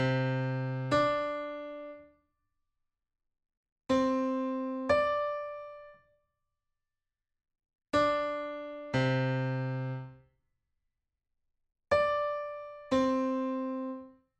Intervalo novena mayor

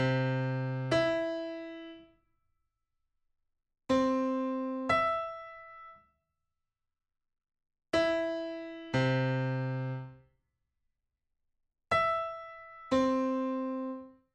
Intervalo decena mayor

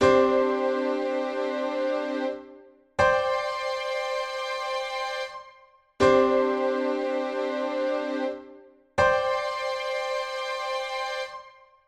acorde segunda suspendida

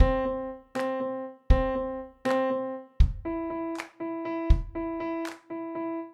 trecillo

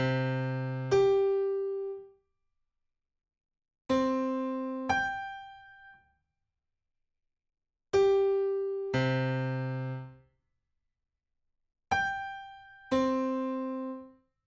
Intervalo docena justa

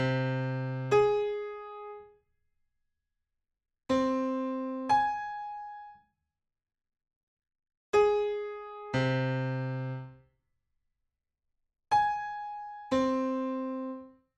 intervalo treceava menor

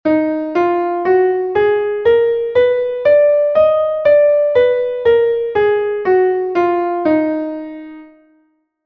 re sostenido menor armonica

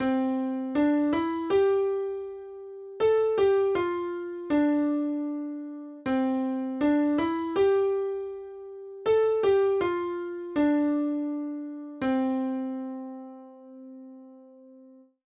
repeticion compas

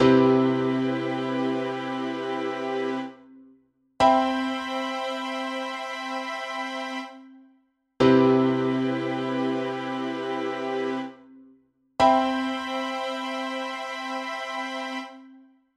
acorde septima mayor

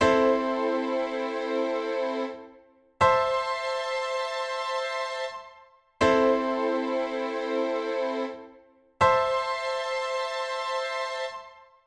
acorde con sexta

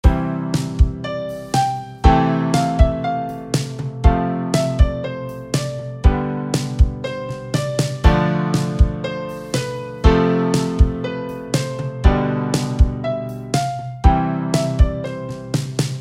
ritmo

